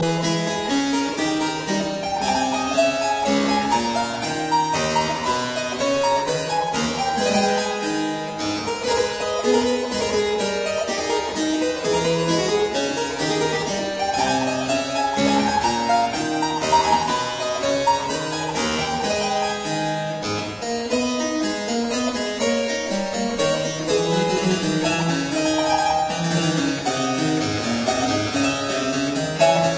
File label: Chaconne in A minor; Croft; Arthur Haas